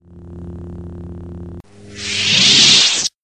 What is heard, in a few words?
Light Saber off; Star Wars